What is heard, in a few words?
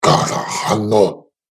Alien Monster Voice